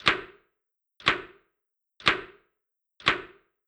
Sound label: clock tick tock loop